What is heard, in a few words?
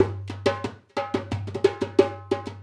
Dumbek Fill; Essential Percussion